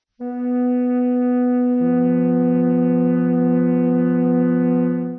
flöte h1 f1; Intervalle, die man durch Potenzen von 2 und 3 darstellen kann

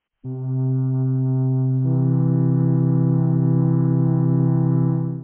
flöte c1 e1; Intervalle, die man durch Potenzen von 2 und 3 darstellen kann

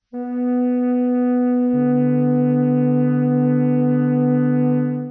flöte h1 d1; Intervalle, die man durch Potenzen von 2 und 3 darstellen kann

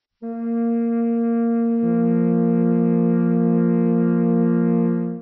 flöte; Intervalle, die man durch Potenzen von 2 und 3 darstellen kann; b1 f1

